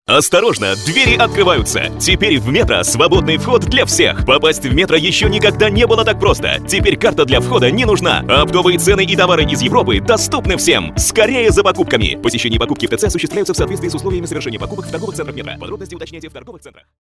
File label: 909 met